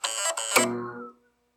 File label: pc switch on